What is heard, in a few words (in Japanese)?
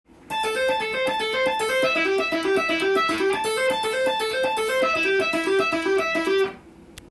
kantan taping; easy tapping phrases tab